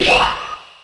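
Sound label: kick of a ball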